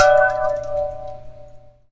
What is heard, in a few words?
can wiggle hit; Weird and Interesting Hits